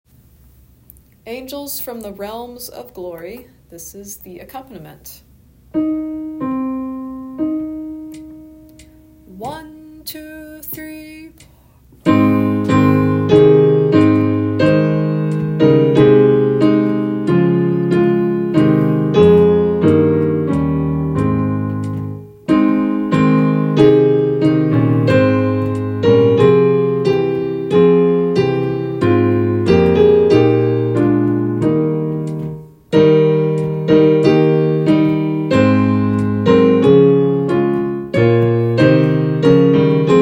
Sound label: accompaniment; Angels realms glory